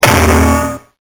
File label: cartoon hammer